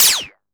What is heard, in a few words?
normal hit clap; osu